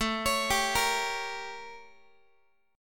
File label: A 7th Flat 9th Guitar; A7b9 arpeggiated; JGuitar